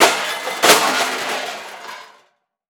Metal Objects Hitting Cement Two Objects; Crashes

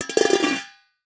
Household Bowl Metal Drop On Cement

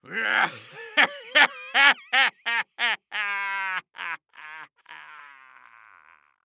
Human Laugh Rich Jerk

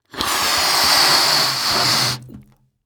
Cartoon Balloon Inflate Short

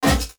Multimedia Internet CD-Rom Flash Buttons Short

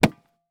Horror Impact Body Struck With Shovel

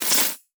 Multimedia Internet CD-Rom Flash Wipe

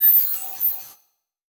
Title Transition Digital Readout; Production Element Title Transition

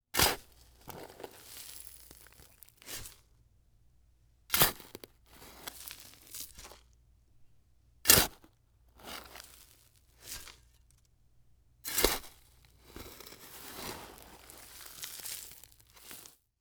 Industry Tool Shovel Digging In Dirt

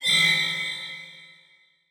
Beep Low Pitch; Multimedia Internet CD-Rom Flash

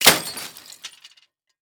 Impact Debris Glass And Plastic Debris Drop

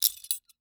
Foley Toy Handcuffs Latching And Opening

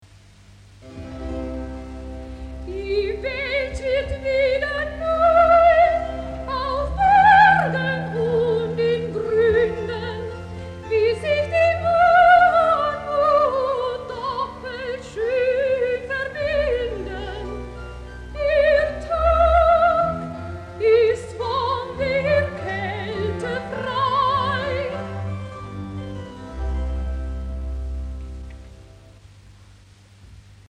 Recitativo

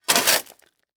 Industry Tool Shovel Dig In Leaf Debris Quick

